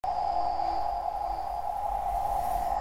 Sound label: Reel Spin Loop; fire Wizard; Axial